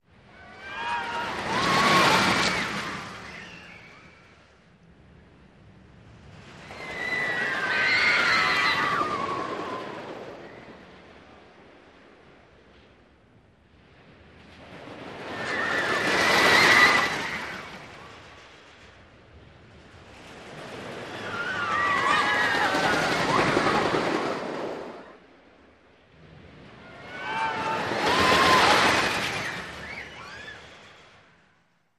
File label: Roller Coaster Bys